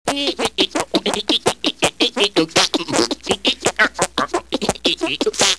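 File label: hand noise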